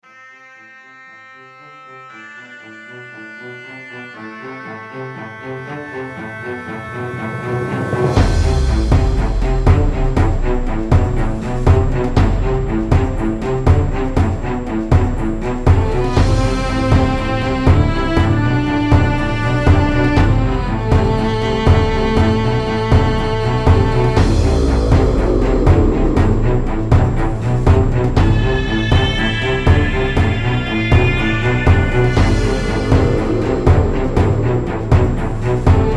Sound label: hollywood hectic epic adventure orchestral 120bpm; full score